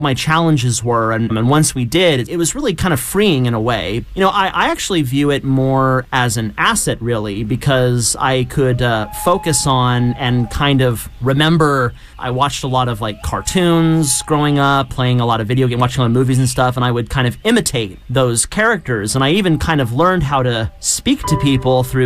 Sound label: Theme, Loons piano